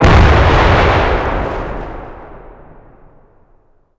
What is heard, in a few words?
Amb Destruction; Red Orchestra Ostfront 41-45